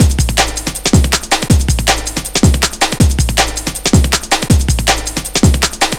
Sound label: 160, Drum Loops, Drum & Bass; Total Drum&Bass